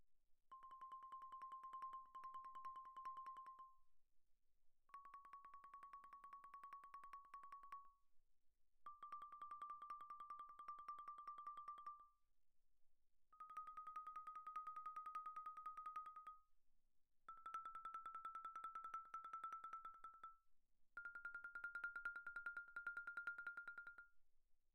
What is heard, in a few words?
xylophone hard rubber roll pp C6 B6; Percussion